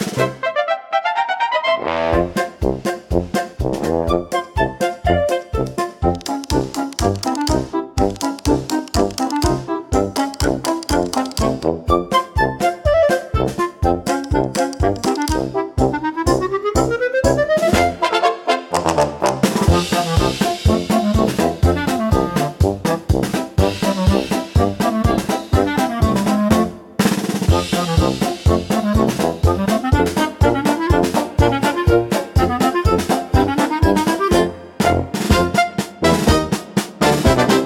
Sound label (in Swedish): AI paradblasorkester Skansk gasmarsch marsch